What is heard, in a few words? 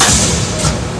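ambient clacky; filtration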